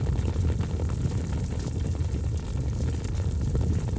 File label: chimney; Seer; GamigoNA